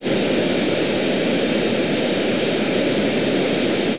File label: crowd